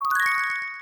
diamond sparkle low; songpop party

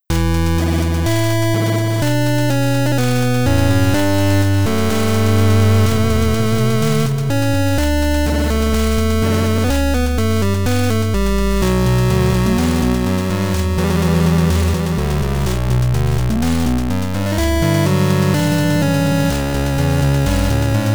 Chiptune; Hope Away

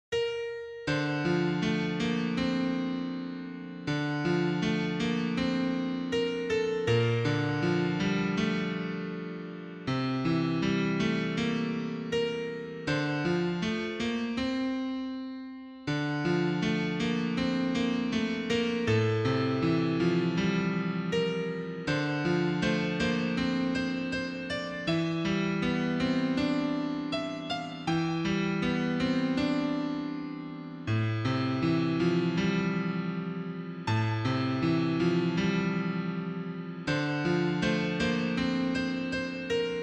Cinematic Sad; sonic epoch

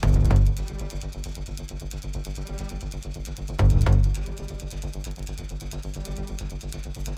Music Tension Pulse High Tempo; Battlefield 3

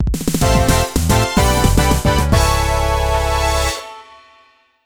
Stage Clear; Super Monkey Ball 2; Monkey Shot

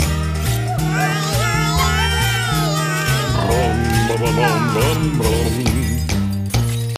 rythm x bass; food world; inferno shooter

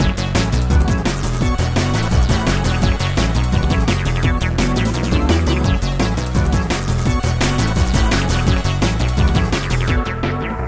Jingle Battle; Sonic Momentum; SAGE 21

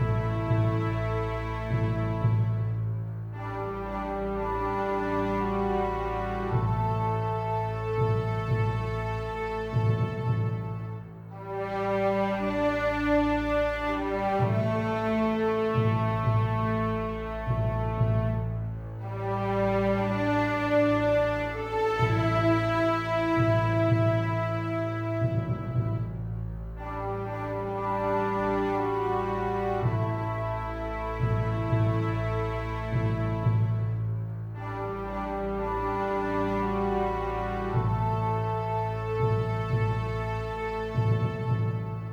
Victory Loop; Might and Magic Clash of Heroes

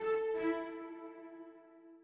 orbit cello; Sky Children of the Light gamerip

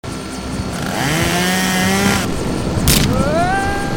Chainsaw; The Plank

